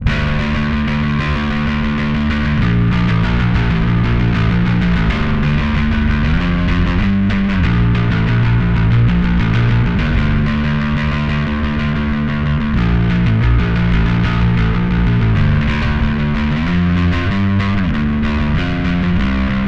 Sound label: Metal dry